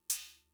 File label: Cymbal; Fricke MFB-522